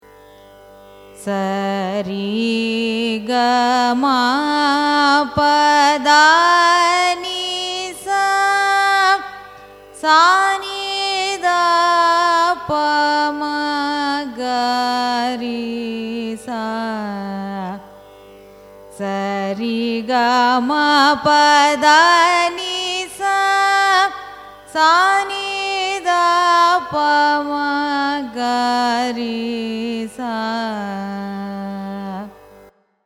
raga rasikapriya arohanam avarohanam; carnatic music

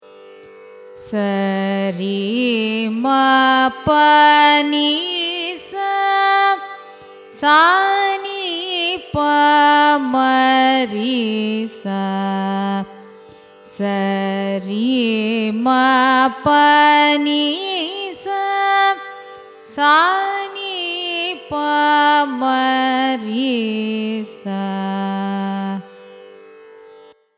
raga madhyamavati arohanam avarohanam; carnatic music

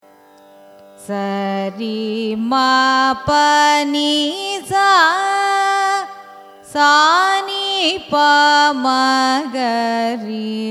raga manirangu arohanam avarohanam; carnatic music

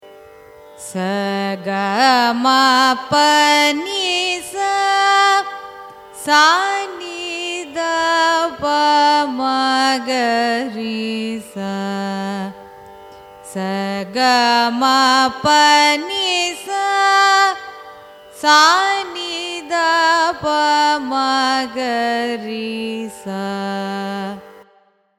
raga abheri arohanam avarohanam; carnatic music

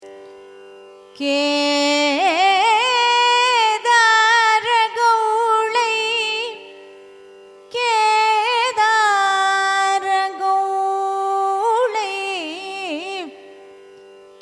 raga kedara gowlai signature; carnatic music

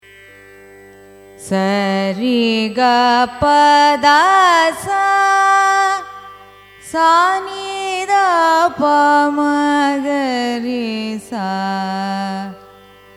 raga mohana kalyani arohanam avarohanam; carnatic music

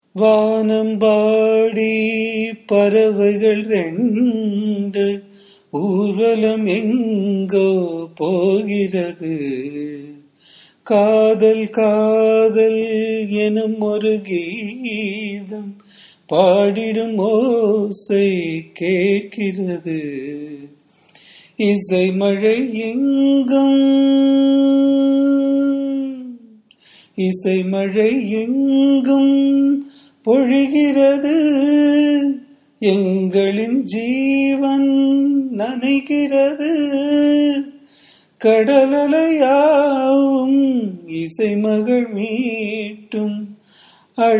raga hamir kalyani, carnatic music

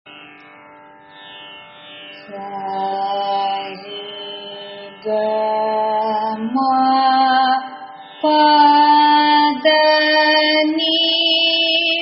kanakangi, carnatic music

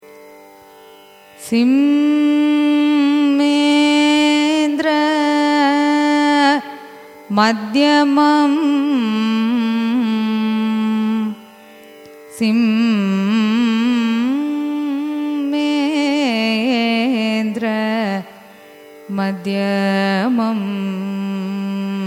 raga simhendramadhyamam signature, carnatic music

